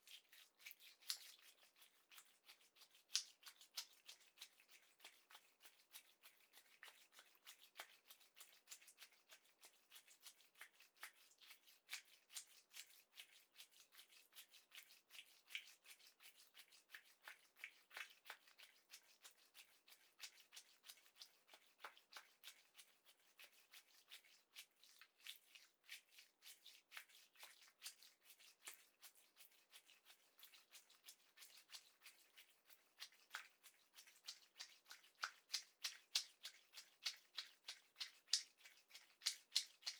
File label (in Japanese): コンドーム 手コキ(高速); アダルト系